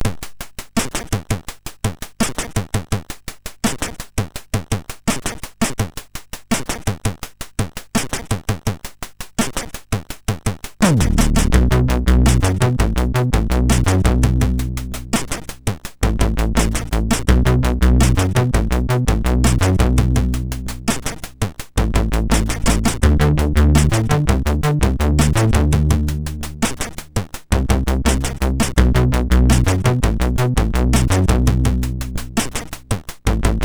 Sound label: Loader, MOS6581R4; Zak Creatures